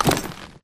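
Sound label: grunt movement foley loud medium; Titanfall 2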